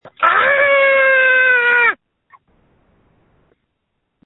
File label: screams